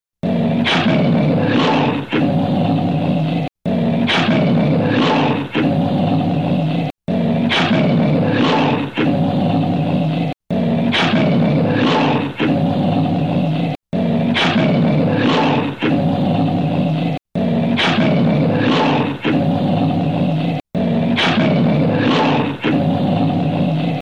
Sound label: lion